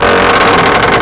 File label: Old Door Opens